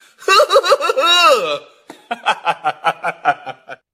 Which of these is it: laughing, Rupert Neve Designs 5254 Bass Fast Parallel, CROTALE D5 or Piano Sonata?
laughing